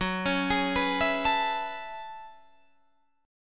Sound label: Gb Minor 11th Gb Guitar DADGAD